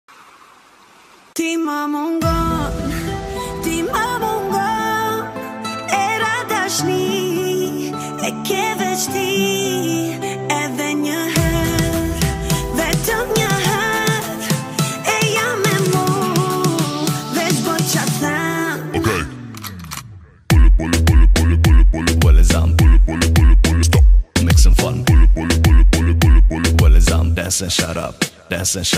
bass music

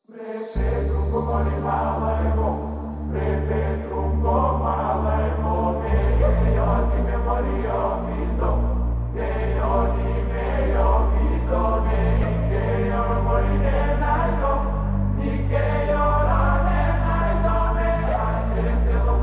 remix slowed reverb

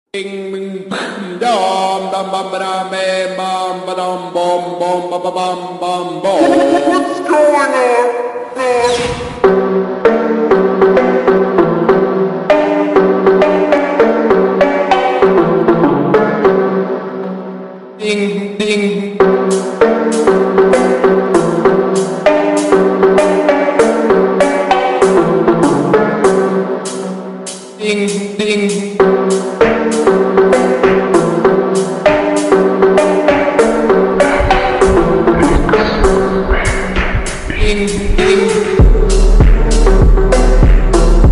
phonk slowed reverb

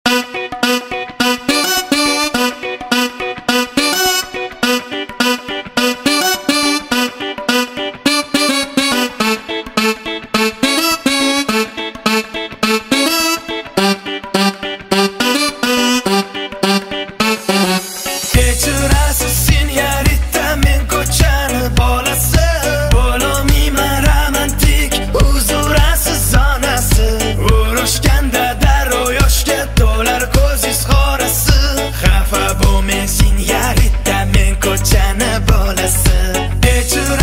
karaoke version

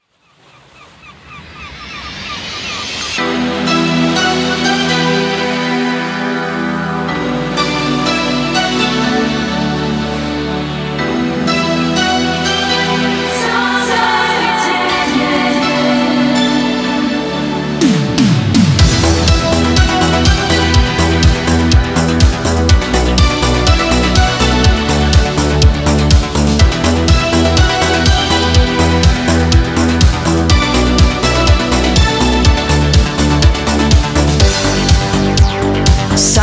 italo disco remix; best italo disco

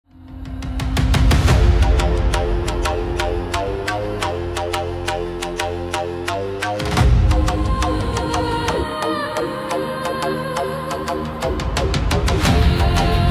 dark metal cover; 90s classic reimagined

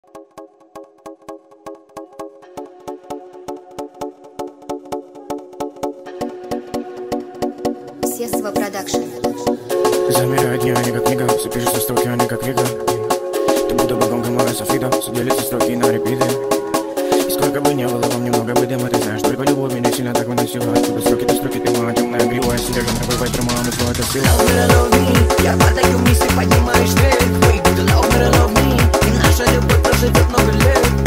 lezginka speed up song

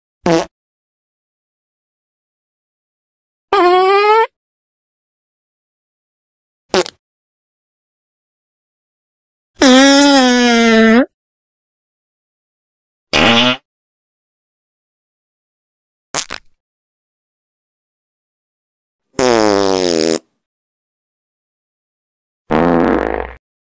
fart meme sound effects; top 10

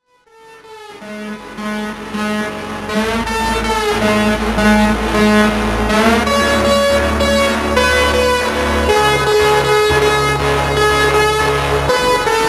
phonk

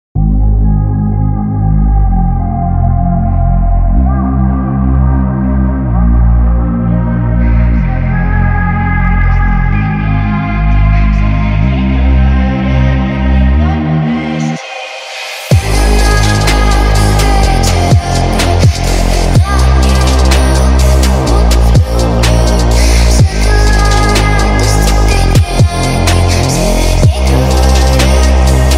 slowed remix bass boosted

